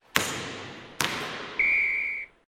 Notification; Acer Liquid E1

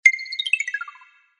Descent, notifications; HTC Magic

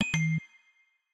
Coin, notifications, Retro; Samsung Galaxy S25 Ultra

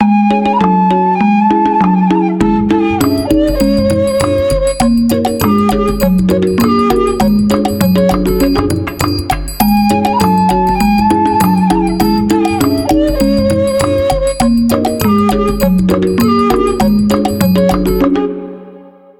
Suling Flute, ringtones; Tecno Spark Go 2023

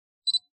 camera focus, ui; China Mobile; A4s

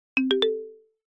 Default SMS tone; Bluboo; S8

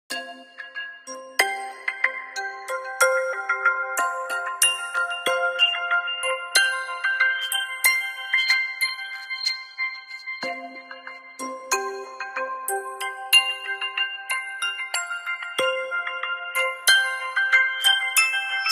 Iron Melody, alarms; Honor; X5

